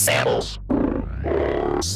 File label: Demon; Lost Tapes From Mars